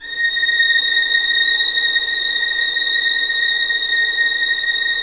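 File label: 1st violins sustain a#6; Sonatina Symphonic Orchestra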